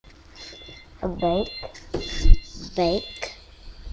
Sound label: rake